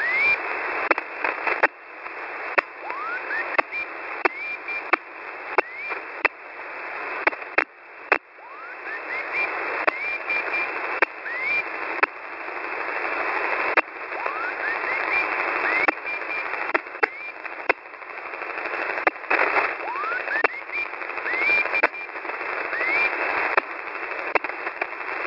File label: unid marker